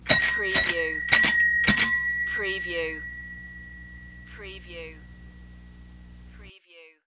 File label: Bell Bicycle Multiple